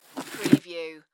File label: Sand Pail Slide Move; sound effects bible